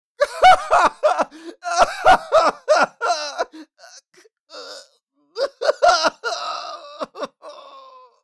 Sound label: Male yelling in pain screaming loudly